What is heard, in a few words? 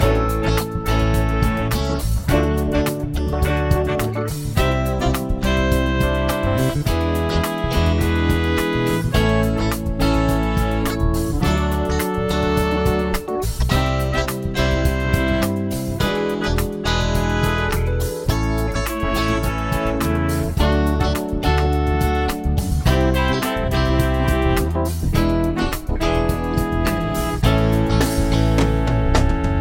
chord prog with mixture